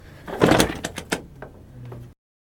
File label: Chair